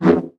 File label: laser sword whoosh fast